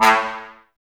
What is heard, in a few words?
brass, Tbs Mute